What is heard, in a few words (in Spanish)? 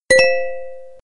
sonido de pin blackberry; tono de llamada